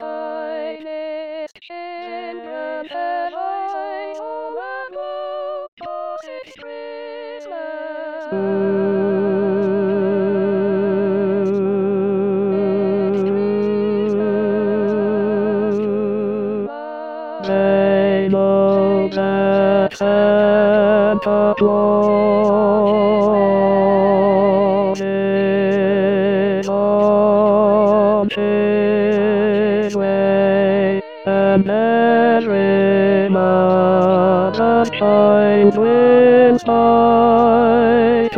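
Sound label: Tenor, rehearsal tracks christmas; Xmas 2019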